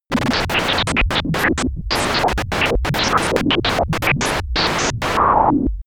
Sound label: disturbed communication